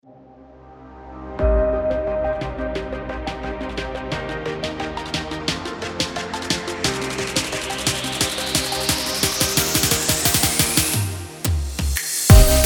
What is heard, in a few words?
sport motivation